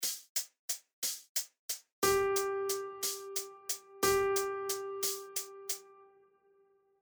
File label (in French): Blanche pointée Ternaire